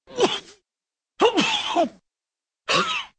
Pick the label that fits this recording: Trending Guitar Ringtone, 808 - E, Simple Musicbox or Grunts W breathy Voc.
Grunts W breathy Voc